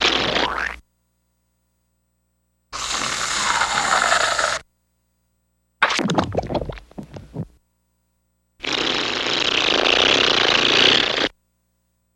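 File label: Squishy Gooey Comedy, squeaks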